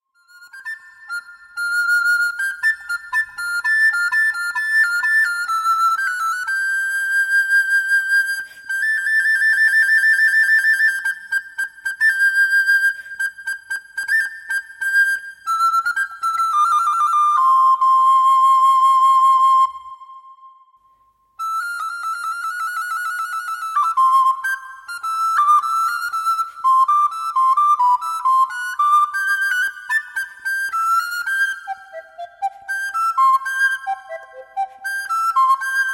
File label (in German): Floete solo